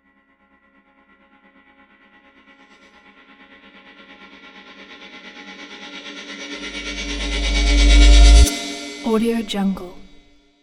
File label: cinematic rise